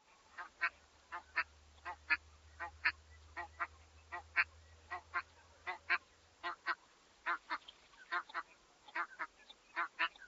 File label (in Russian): shirokonoska